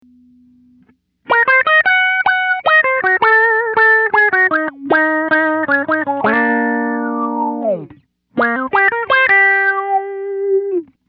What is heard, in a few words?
filter; Mobius